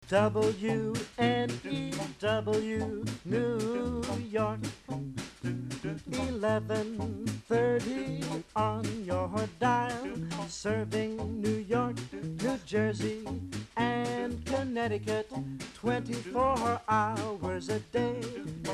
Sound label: Doo Wop